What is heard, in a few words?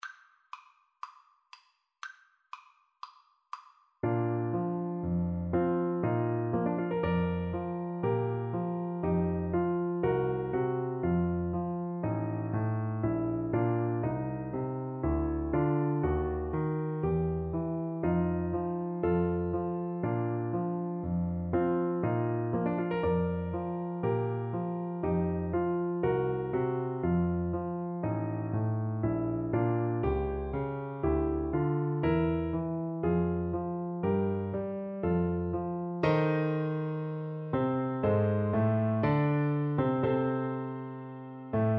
Tuba